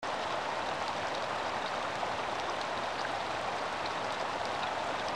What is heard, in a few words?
rain nice indoor; hydrosity